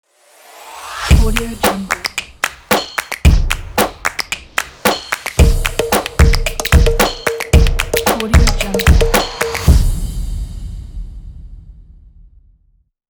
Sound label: clap in logo